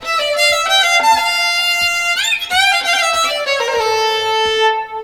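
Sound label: Balkanish Traditions strings; USB Soundscan vol.63; AKAI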